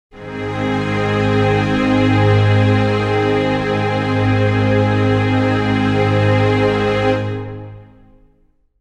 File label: string chord g sharp major